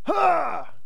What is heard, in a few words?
Pain, soldier; hellenes